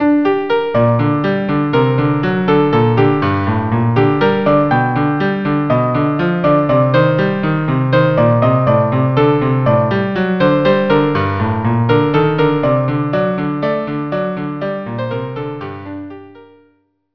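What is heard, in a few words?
violin cello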